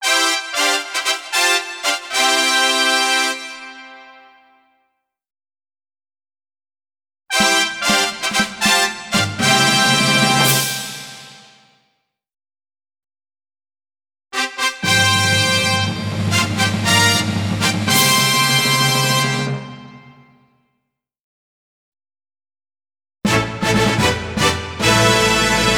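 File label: introductory fanfare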